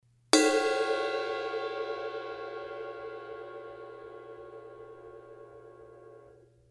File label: A Avedis Bell, cymbal